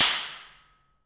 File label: PRC ALMWA, Aluminum Wall; Rhythm Section; FX Perc